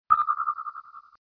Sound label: Sonar ping; kritter krawler